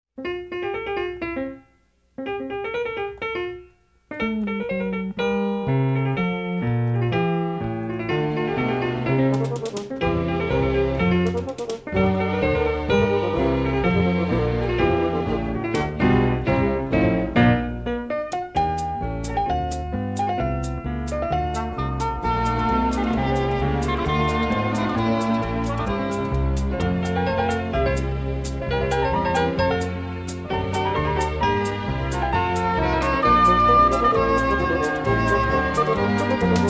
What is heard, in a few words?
Flat Baroque; Oldies